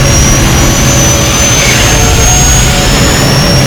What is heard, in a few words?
helicopter spindown stream; Explosions